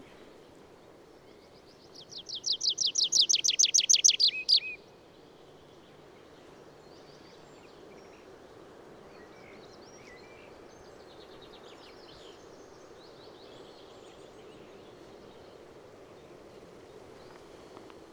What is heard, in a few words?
Tree Pipit Anthus trivialis, tree pipit call